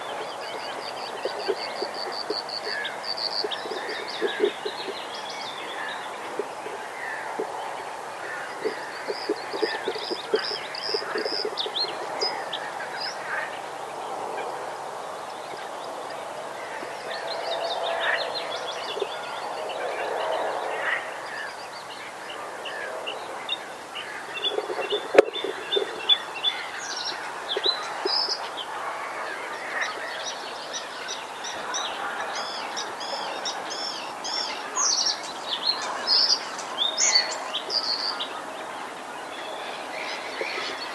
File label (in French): gorgebleue sterne